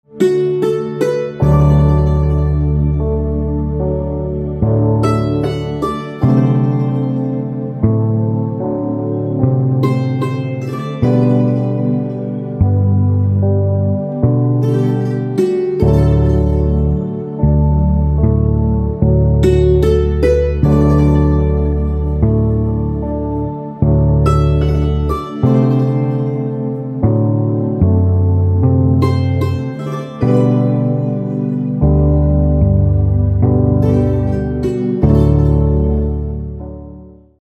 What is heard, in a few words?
Santoor